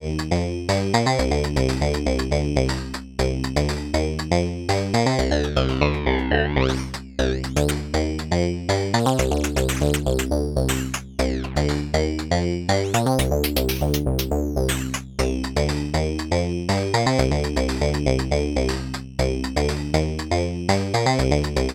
Linnaeus Filter ext F MOD MODOSC Track; Rossum Electro Music

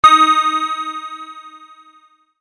Bell all

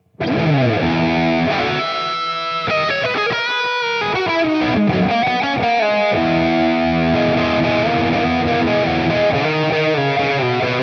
overdrive; ToneMasterPro; Cliff Diving

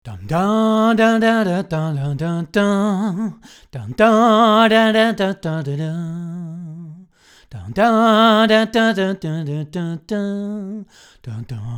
Camden neutral

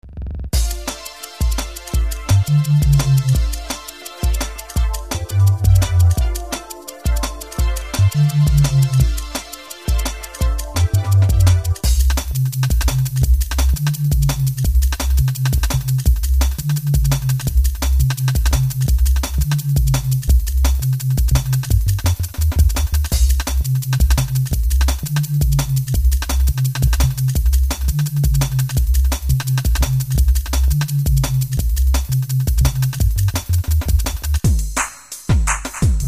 drum n bass

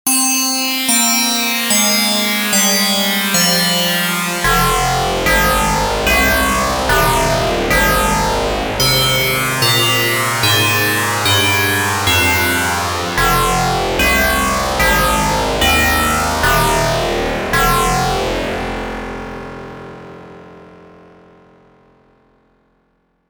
Elektron Digitone Superwide

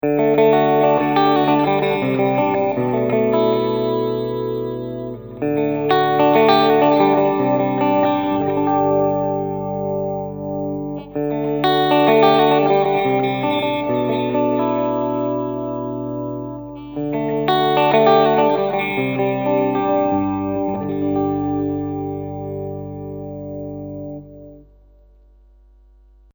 Bridge Neck Clean

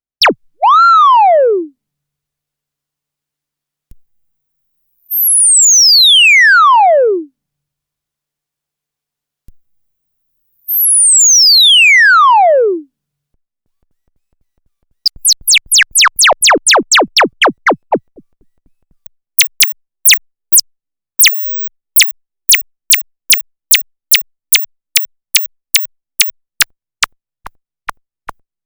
MicroBrute Filter Zap Variations

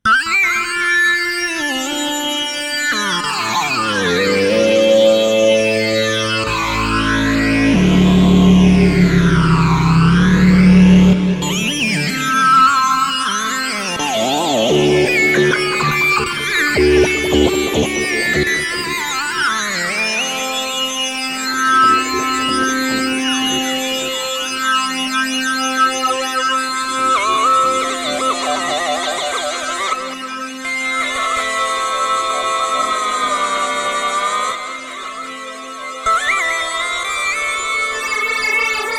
Korg minilogue Moog MF103 Lead; Flashback X4

